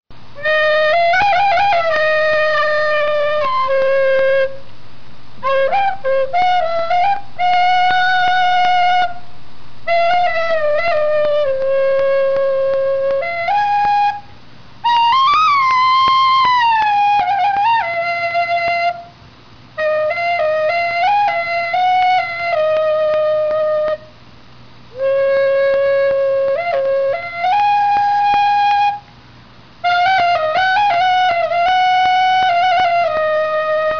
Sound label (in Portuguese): gaita